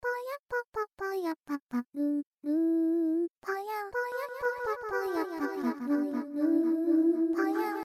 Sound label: Delay Stereo